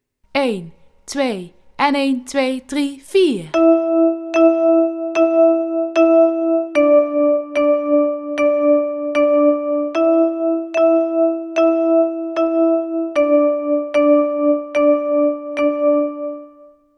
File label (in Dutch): partij